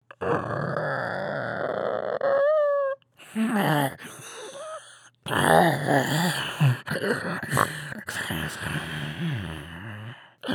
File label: Creature